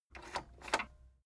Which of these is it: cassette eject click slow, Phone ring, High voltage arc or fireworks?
cassette eject click slow